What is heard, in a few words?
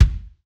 Kick Acoustic Alternate; Drums; One Shots; Ghosthack; Drum Mega Bundle; Drum Hero 5